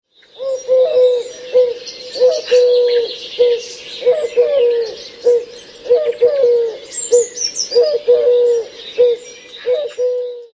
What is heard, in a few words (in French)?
tourterelle; loto sonore; pour les petits; loto des animaux